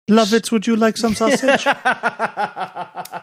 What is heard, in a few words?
singing would you like some sausage; lovitz